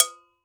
GANKOKWE, Drums; Drums&Percussion; Gankokwe Bells; E-MU Producer Series Vol. 7 – Old World Instruments

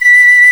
fife, British fife; Roland L-CDX-03 Disk 1

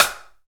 SNR; snares; Roland - Rhythm Section; Snares 1; Sn Modules 1

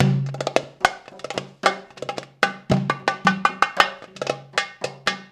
PERC LP, Arabian Traditions

